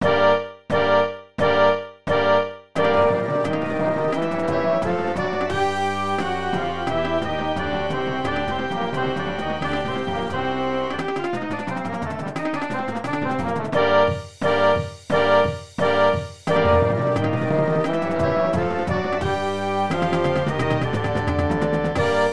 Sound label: Drums